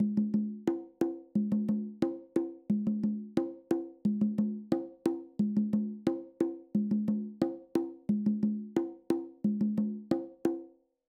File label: Conga Pattern